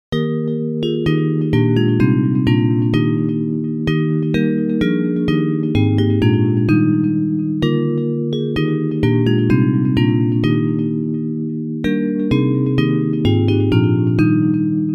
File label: Bells; The Voice of God Is Calling; Hymns